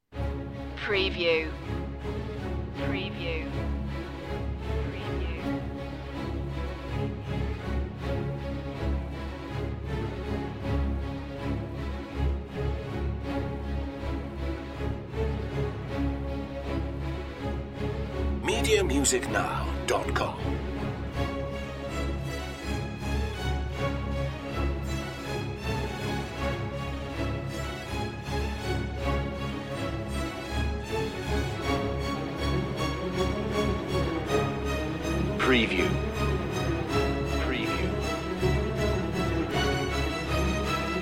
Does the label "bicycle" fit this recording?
No